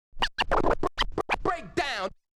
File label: OLDSCHOOL SCRATCH 96